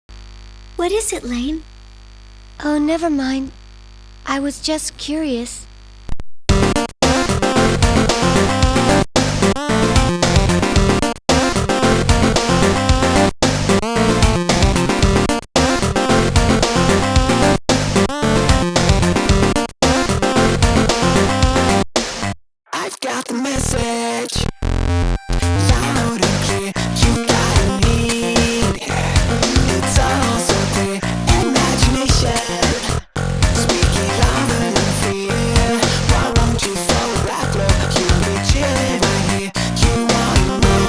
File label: Chiptune